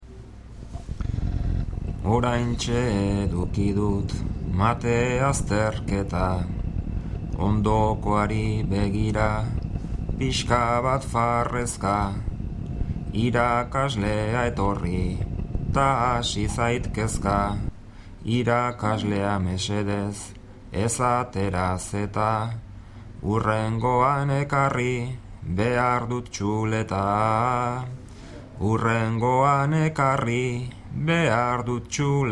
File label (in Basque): bertsos